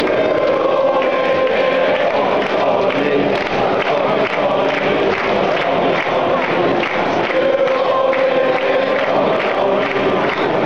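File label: convict colony, football chants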